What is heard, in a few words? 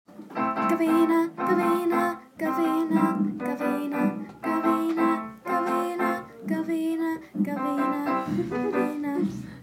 piano